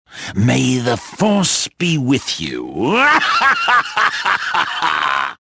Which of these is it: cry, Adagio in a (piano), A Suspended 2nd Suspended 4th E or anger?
anger